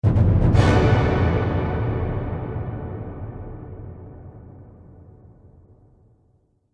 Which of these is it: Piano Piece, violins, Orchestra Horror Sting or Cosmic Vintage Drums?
Orchestra Horror Sting